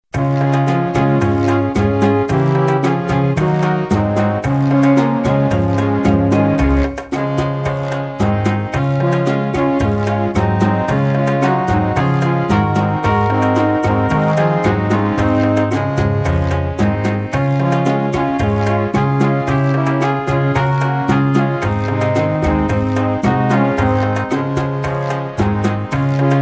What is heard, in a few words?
rumba